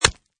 Impact Flesh, Knife, axe or dagger impacts with human flesh or head